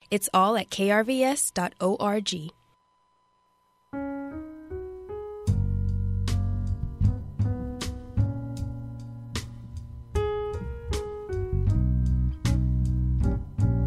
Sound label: jazz